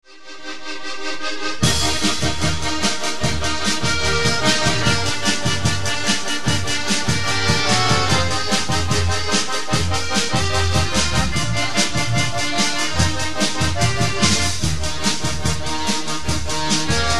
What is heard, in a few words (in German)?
Italo Pop Hits